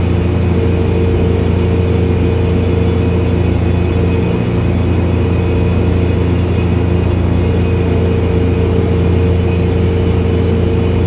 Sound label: refrigerator compressor; Call of Duty Modern Warfare